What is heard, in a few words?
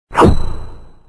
poof